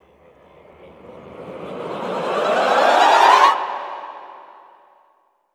SWELL MED, Classical Choir; Best Service; Extended Classical Choir; SWELLS

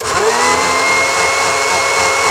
BLENDER, KITCH

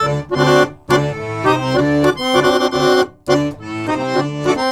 Balkanish Traditions; USB Soundscan vol.63; AKAI

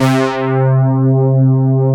JX-10P, JAP SYNTH; East West - Global Sounds

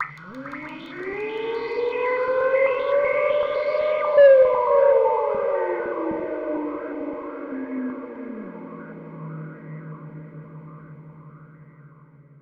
Bomb Raid; Zero-G; Soundclash Nu Dance Electro; Acidized Wavs; FX Atmospheres

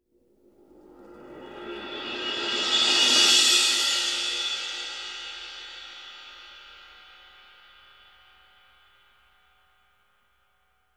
RIDE SUS A, RIDES; Sampleheads; New York City Drumworks VOL-1; SP RIDES

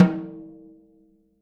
SN OFF, snares; Sampleheads; Peter Erskine Living Drums

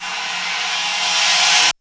Rev-Cym; Roland R-70

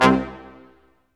BRS Quintet stac, Symphony Orchestra; Roland LCDP08

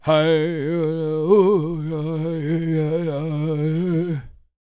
World Voices, CHOCTAW

